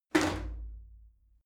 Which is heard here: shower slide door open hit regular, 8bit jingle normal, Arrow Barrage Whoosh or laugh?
shower slide door open hit regular